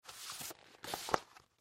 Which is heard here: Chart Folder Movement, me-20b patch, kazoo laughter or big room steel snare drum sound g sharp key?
Chart Folder Movement